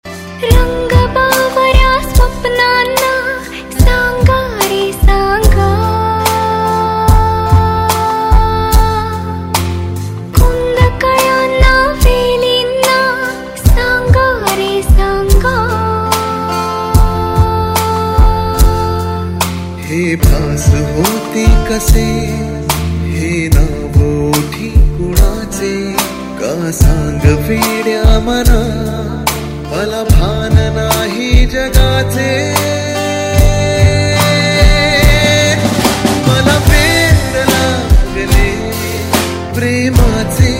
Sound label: Duet Marathi Ringtone